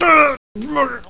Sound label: death; kingpin